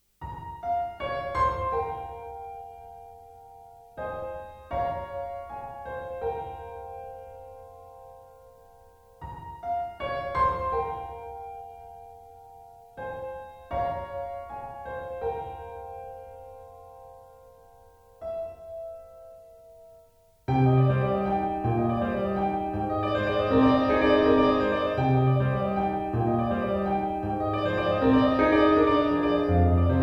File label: piano pop